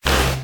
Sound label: beam; papersiomania